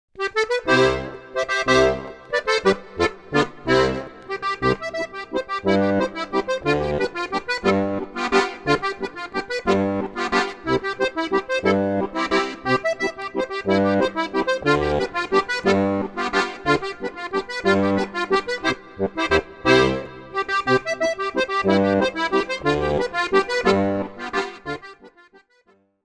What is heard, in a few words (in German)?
Laendler